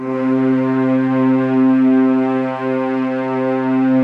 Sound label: LOW C3, SFT LOW VLNS; Q Up Arts; Denny Jaeger Master Violin Library